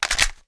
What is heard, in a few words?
sg550 magin